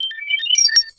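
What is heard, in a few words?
sparkling ascending chime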